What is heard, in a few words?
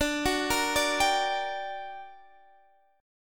G Minor Major 7th, D, guitar chord